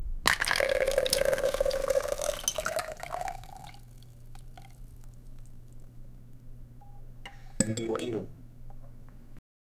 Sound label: the bartender pours beer, bar